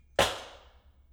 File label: table foley; Doom